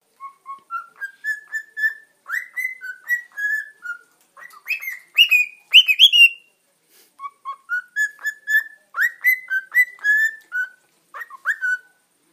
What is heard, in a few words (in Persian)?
صدای آواز خواندن عروس هلندی; صدای پرنده در صبح و طبیعت